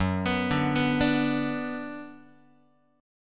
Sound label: Gb Suspended 4th Sharp 5th Gb, down, Guitar; arpeggiated; JGuitar